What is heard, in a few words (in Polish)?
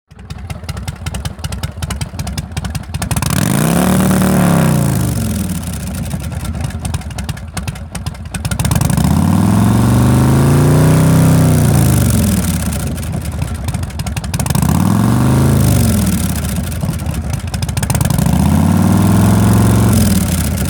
Wkladka okolo limitu, moto Tlumiki